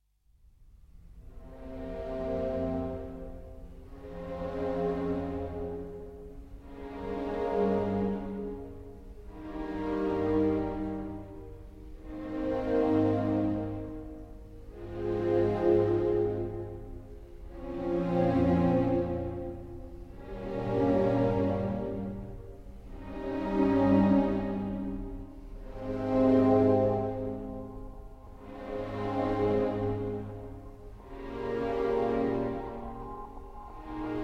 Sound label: String Orchestra